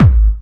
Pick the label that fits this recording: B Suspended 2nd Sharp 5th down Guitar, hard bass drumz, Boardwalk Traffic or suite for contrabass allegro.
hard bass drumz